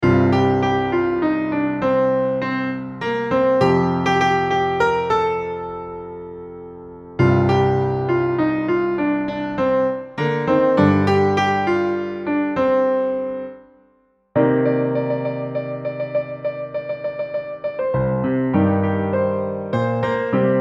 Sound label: solo, piano; Sarkaru Vaari Paata